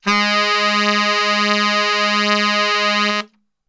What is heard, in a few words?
G#1 SAX STR, Brass; Best Service; Brass Super Section